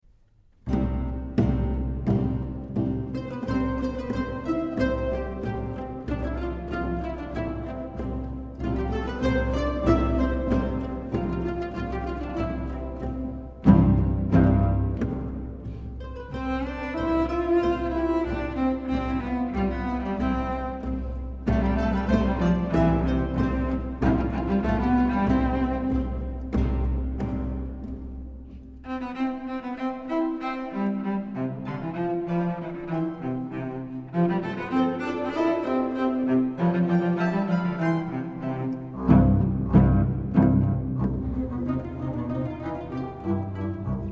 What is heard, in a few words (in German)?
Zupf Konzert Divertimento; Divertimento fuer Z 4 Satz